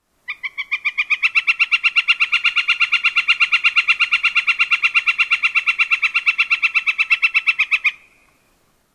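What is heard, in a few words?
kikikiki yellow-shafted, Northern Flicker; NY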